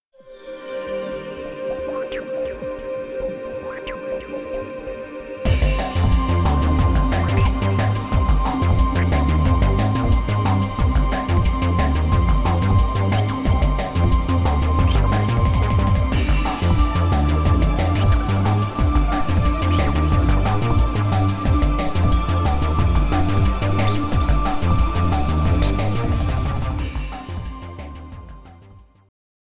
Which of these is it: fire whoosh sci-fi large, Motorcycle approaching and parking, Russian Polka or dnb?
dnb